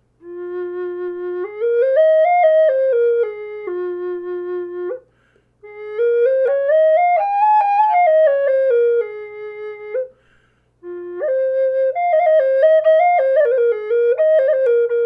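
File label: F4 spalted tamarind, Native Style Flutes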